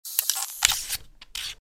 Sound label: Night vision goggles removing; military equipment sound effects